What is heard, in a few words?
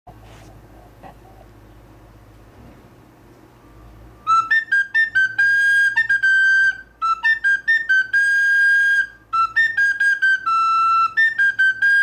Shvi